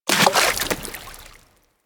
Fish Jump Splash E; Eidolon; Warframe; Ambience; Eidolon Plains

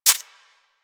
Rodent Step Dirt; Warframe

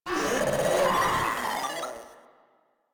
Proto Infested Runner Vocal Attack; Warframe

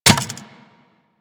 Worker Metal Leg Step Default; Solaris; SolarisWorker; Steps; Warframe

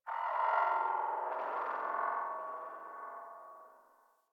Landscape Distant Robot Call, Ambience; Warframe; Venus Open World; One Offs

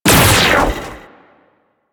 Metronome; Warframe; Amalgam Moa; Abilities

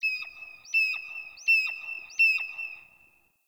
Bird Mountain, ambience; One Offs; Glades Of Andurin

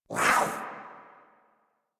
Kavat Vocal Attack Short; Warframe; Khora

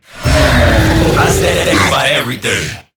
Infested Lich Drillbit Death

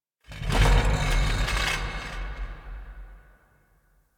Ceramic Rumble; Ambience

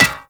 Melee Staff Impact Generic; Staffs

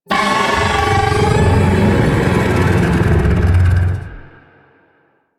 Arachnoid Camper Vocal Attack; Warframe; Corpus

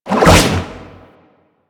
Aqua Board Jump; Warframe; Yareli

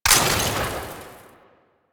Ammo Pickup Heat; DE; Warframe; Warframes; Frumentarius; AmmoAbility

